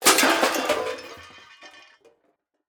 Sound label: cookware drop; skillet